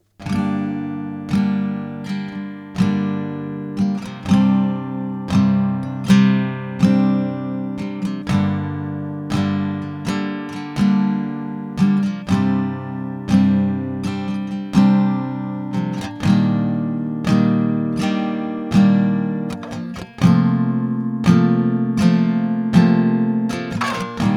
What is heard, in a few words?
Gm120 GTR chords